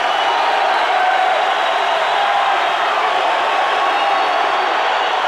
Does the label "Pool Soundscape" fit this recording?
No